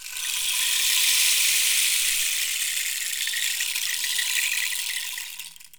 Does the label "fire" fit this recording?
No